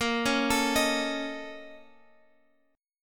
A sharp Minor Major 7th Double Flat 5th, down, Guitar, Open F; arpeggiated